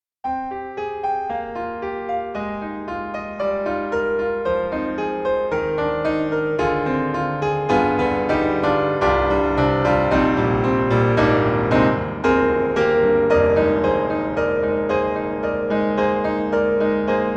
Bosendorfer 290 1st Row Seating; Steinberg The Grand 3

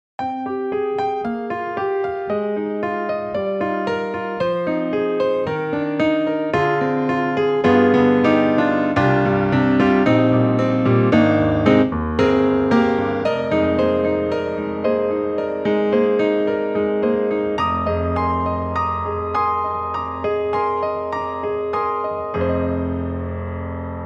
Grand Rhapsody Piano, Basic Grand; Waves

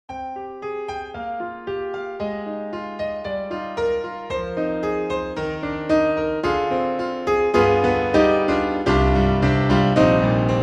Piano Aggressive; Cinesamples; CinePiano

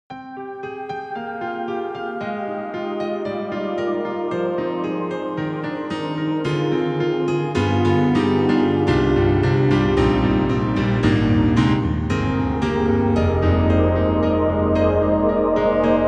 Piano Pad; KORG KRONOS 2

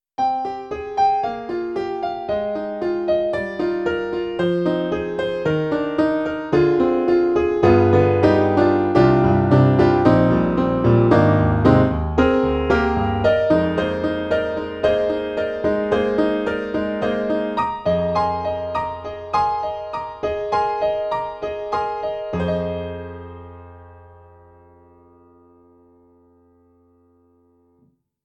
E-MU Holy Grail Expansion ROM, Stadium

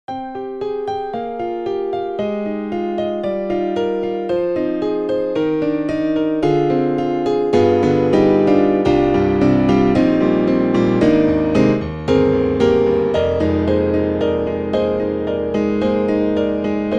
Grand Piano + Keys; Steinberg HALion 3; Grand Piano 1; XXL

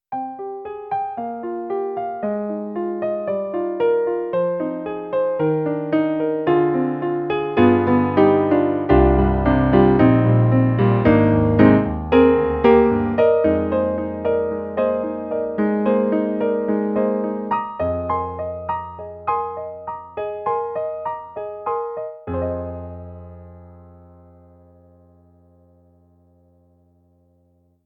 Grand Room; E-MU Sounds of the ZR Expansion ROM

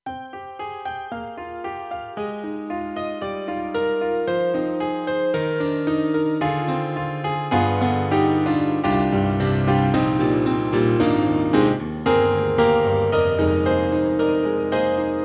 KORG M3 XPanded Romance Piano Bell

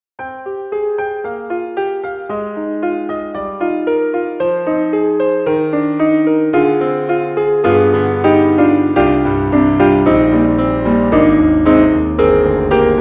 Grand Piano; SONiVOX; DVi Sound Stage

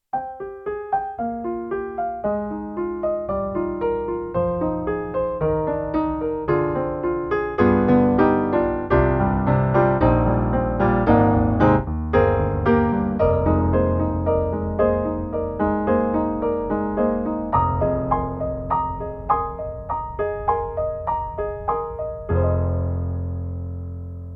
YAMAHA MOTIF-RACK ES Home Piano

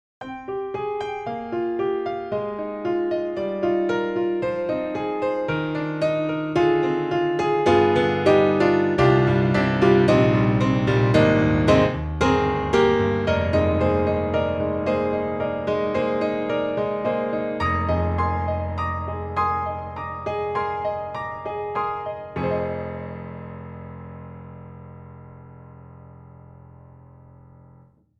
Grand Pianos, Studio 7ft, Pop Ballad C7; Synthogy; IvoryII

